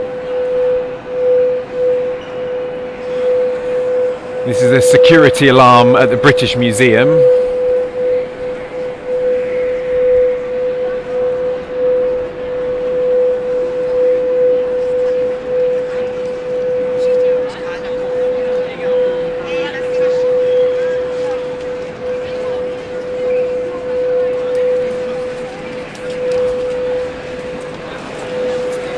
security alarm at the british museum

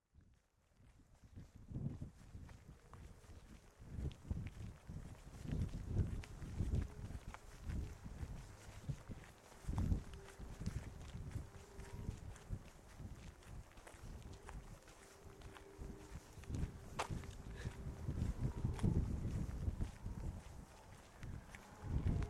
singing ringing tree

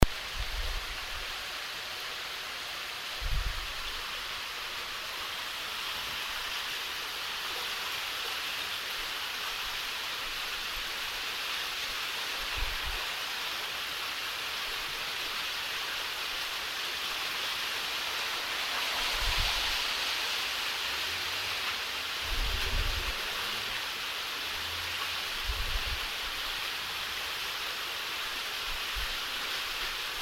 fallowfield storm drain

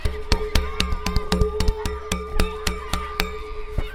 pole banging

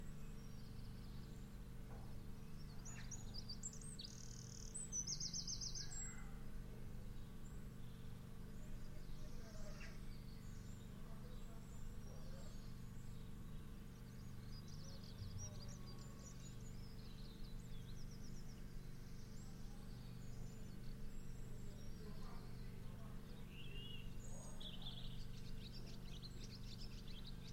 soundscape

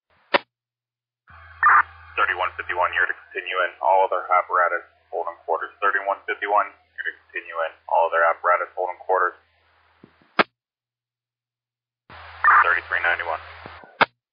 alert tones page received

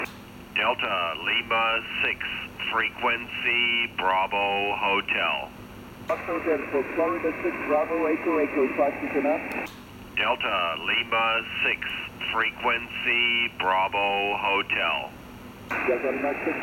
ssb 15m

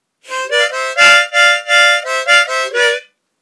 harmonica

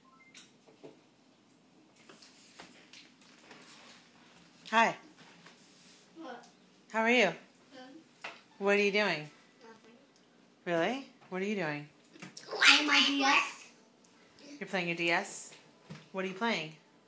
kids

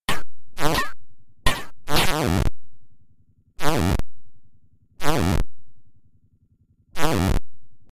c64 sound effects; guess the game

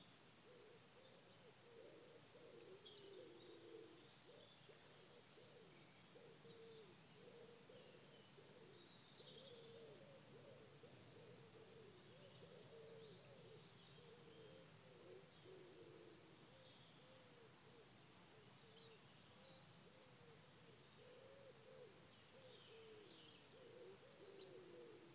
birdsong in horning churchyard

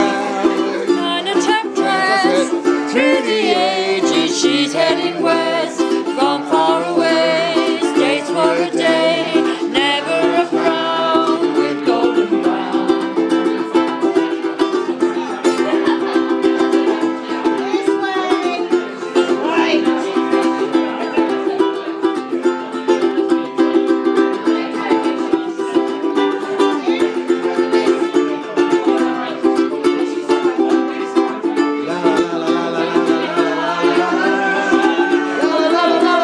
ukulele

